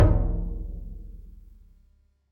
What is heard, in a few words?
taiko stage start